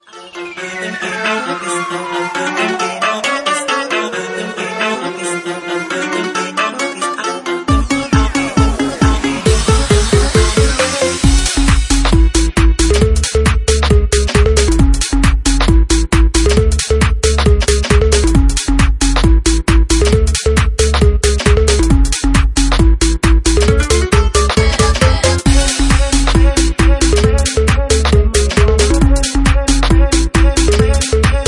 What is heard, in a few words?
Organ Bass Remix